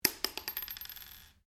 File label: Cartridge 22 caliber short impact polished wood, shell casings; shell casings of different calibers impacting on different surfaces